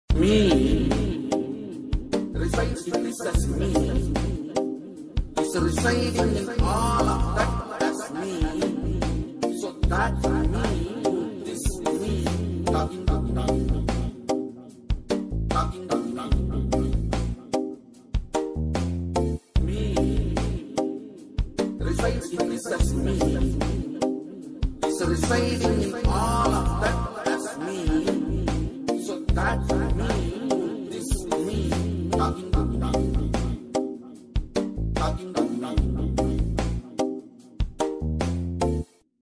telugu bgm ringtones; raja raja chora movie

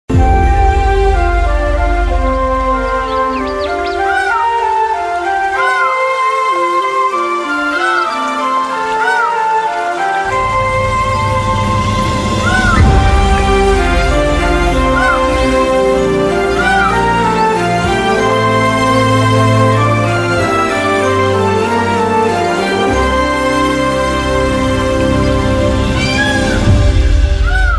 movie background music ringtone; shakuntalam

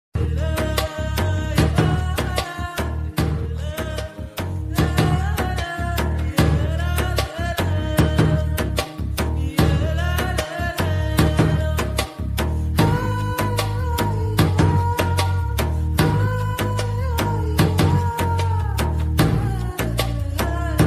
love bgm ringtone tamil bgm ringtone; paruthiveeran

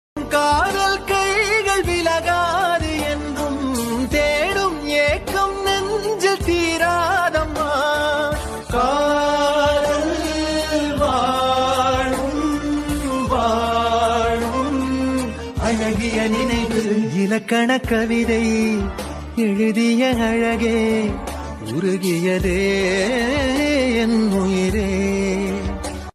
tamil bgm ringtones

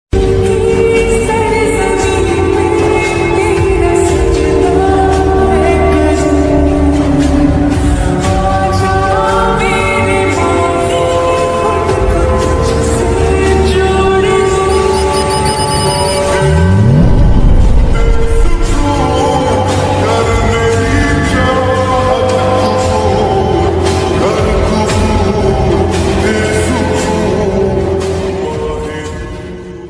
lofi ringtone instrumental

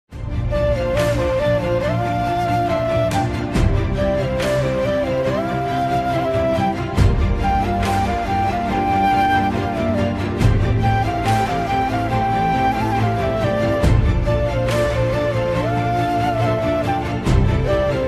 tamil love bgm ringtone

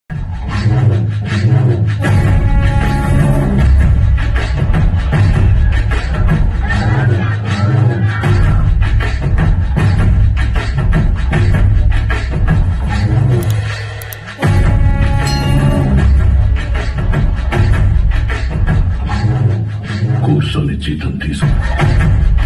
villain bgm ringtone; aadikeshava

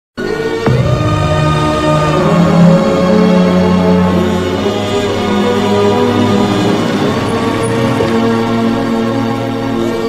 sad bgm emotional bgm ringtone; arya

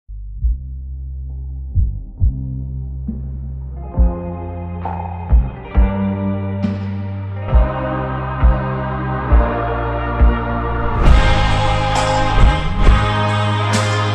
instrumental

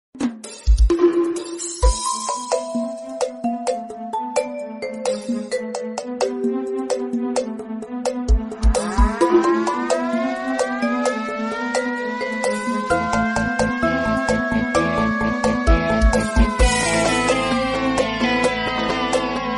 background song mobile ringtone party ringtone; free fire

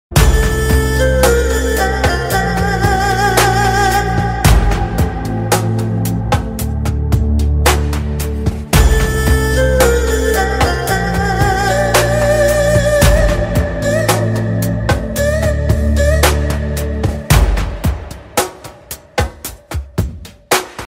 trailer bgm ringtone movie bgm; vaushnav tej

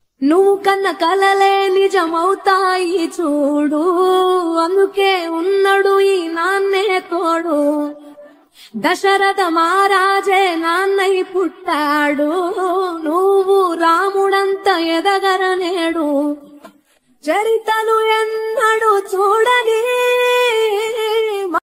emotional; vimanam; love father

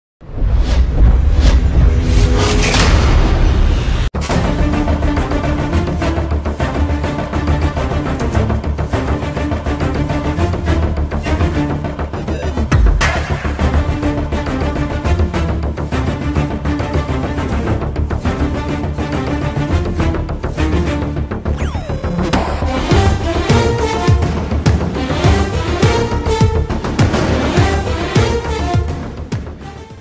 cool bgm ringtones; thuppaki

